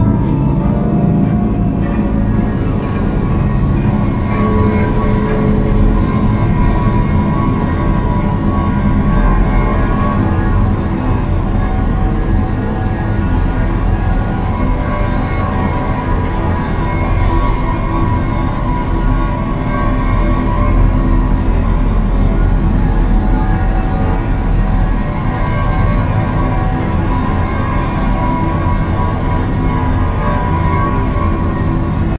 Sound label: Mood Terror; Vectron; Steamworld Dig 2